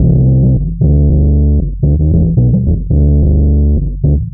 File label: bass filtered, Loops, Phonky